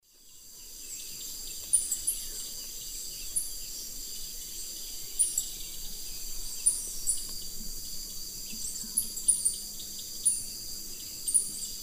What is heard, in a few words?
Tangara mexicana, calls and flight calls; S. America; Eastern Lowlands